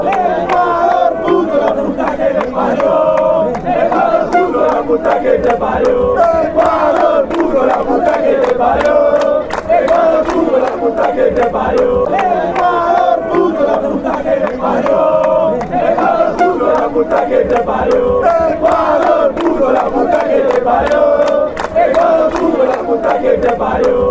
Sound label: wore bore you brazil, fan chants